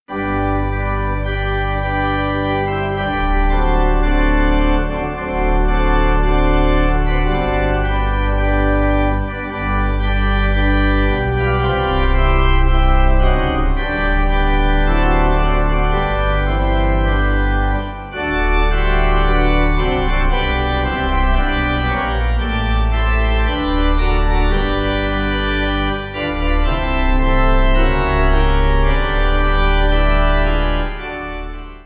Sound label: Pipe